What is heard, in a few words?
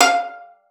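violin pizzicato F5; Xsample VOL-5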